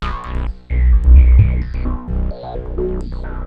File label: T-WAVE, Synth Waves